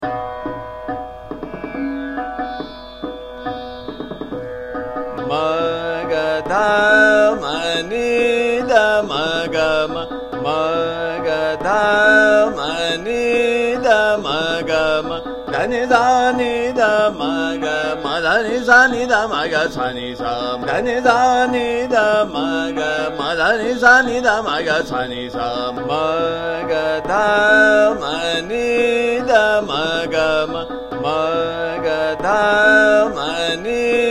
Sargam - Ma Ga Dha Ma Ni Dha Ma - Ektaal Drut, Kaushik Dhwani